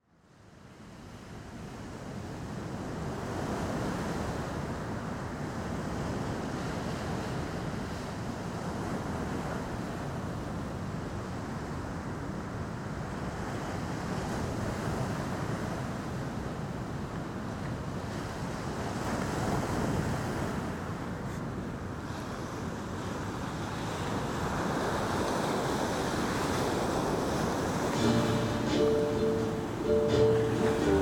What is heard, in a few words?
with kauai waves